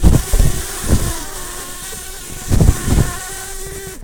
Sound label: bumblebees, Pascuorum queens